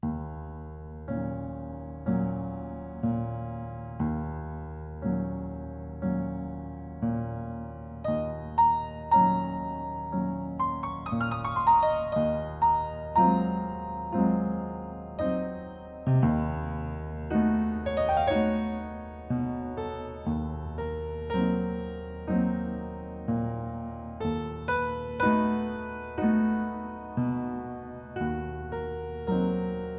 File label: Piano Calm; Layers of Fear